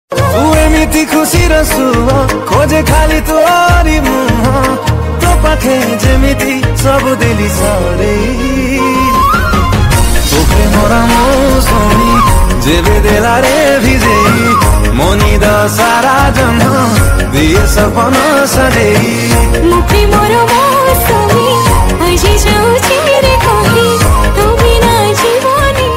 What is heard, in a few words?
Odia Ringtone